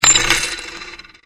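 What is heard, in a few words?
coin fall long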